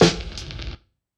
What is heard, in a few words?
drumbreak snare